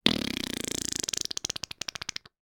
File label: human mouth fart